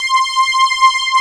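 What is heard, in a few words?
UHF strings B, Roland, Analog; USB Soundscan vol.49; Analog Fantasies; AKAI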